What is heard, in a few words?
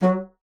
bassoon F#3; Xsample VOL-6; C BASSOON